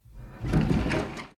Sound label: Window open; the 39 steps